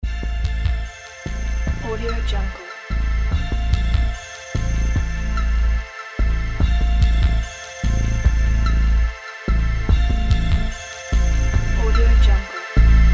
80s suspenseful tv theme

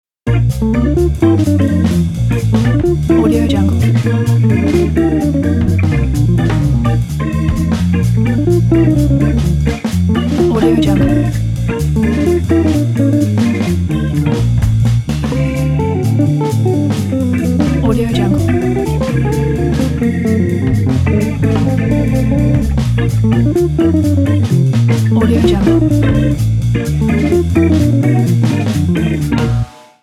good times jazz

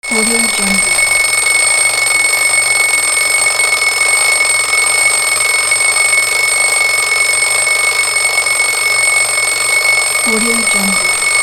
alarm clock

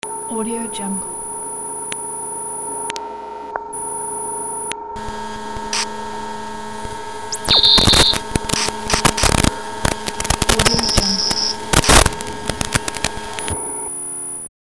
static radio noises

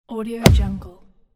stamp

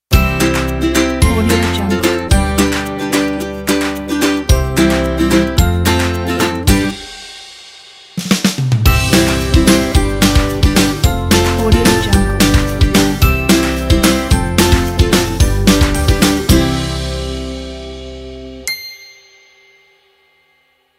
ukulele fun ident; guitar237

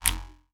ship set scroll; soundmorph

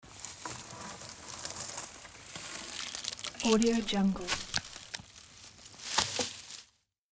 walking through tree branches

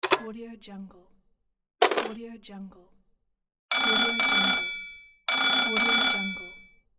phone

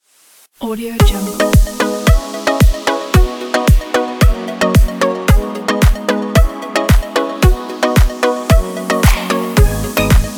energetic inspiring summer pop

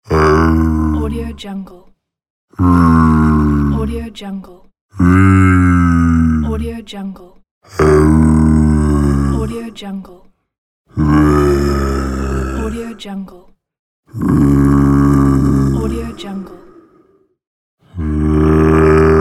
cartoon zombie

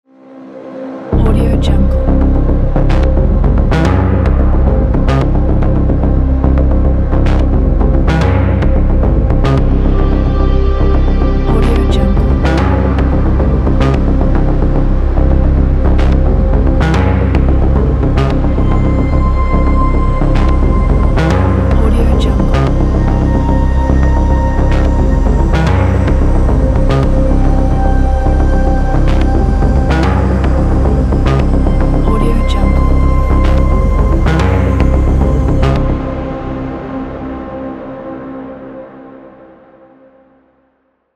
dark abstract industrial ambient

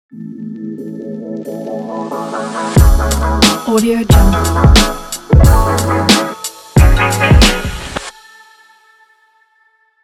urban hiphop intro

